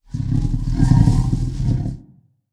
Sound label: garg idle; slender